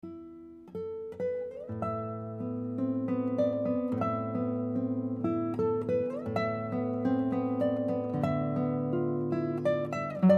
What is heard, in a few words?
guitar; by Nikita Koshkin